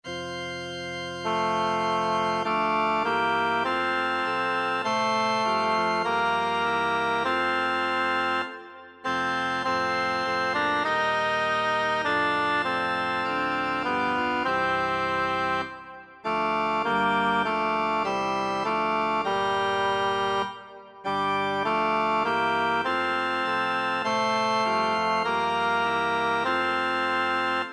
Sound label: tenor; isaac heinrich; insbruch ich muss dich lassen